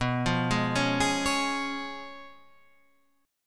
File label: Db Major B; arpeggiated; JGuitar